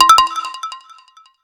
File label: notifier shake; Orange Sanza